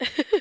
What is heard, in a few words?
Low pitched song like female